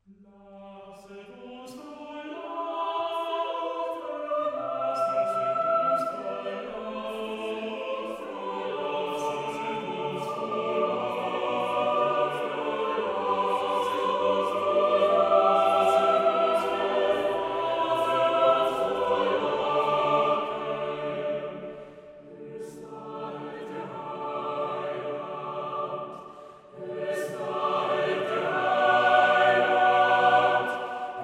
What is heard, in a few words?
lasset uns frohlocken for double chorus in G major; let our hearts be joyful; op 79